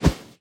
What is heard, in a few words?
Shovel Swing